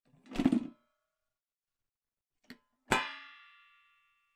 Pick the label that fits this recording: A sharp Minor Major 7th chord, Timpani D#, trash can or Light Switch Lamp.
trash can